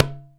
HEADED LO, MALAYSIAN DRUM; AKAI S6000 CD-ROM - Volume 5

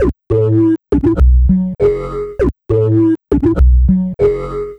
Bassss; Sonic Foundry (Sony Creative Software); Synchro Funk

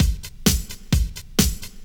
drum 130 straight; Techno Trance Essentials

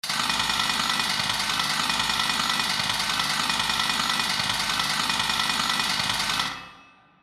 Alarmz

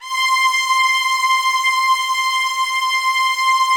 C6, LOUD VIOLINS; Q Up Arts; Denny Jaeger Master Violin Library

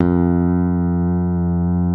STICK, GUITAR FX

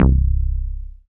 BAS FM DX-7

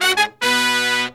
120BPM, Horns

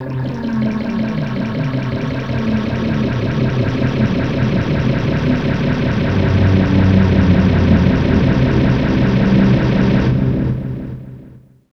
ambiant, special FX twisted; USB Soundscan; AKAI

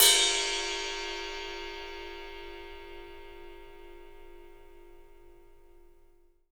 PW RIDE, RIDE CYMBAL, 3D Drums; 3D Sound

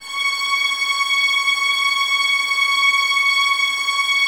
Violins Tremolo C#6; Miroslav Vitous; 11 Violins

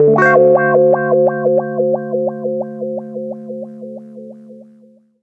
089 WAH PAD, Electric Guitar Loops; USB Soundscan vol.04 - Electric & Acoustic Guitar Loops; AKAI

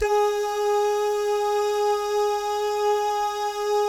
DAH, Vocal Jazz; ILIO

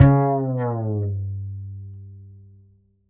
Upright Acoustic Bass, 1ST POSITION; Larry Seyer

